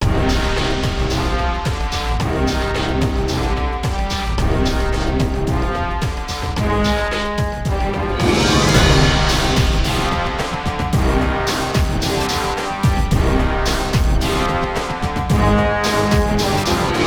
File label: Bridge Combat; shrek 2 unofficial complete ost